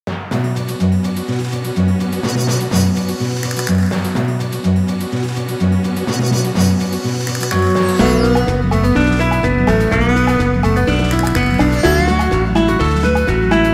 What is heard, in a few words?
BGM western horse; Yo-kai Watch 3; 3DS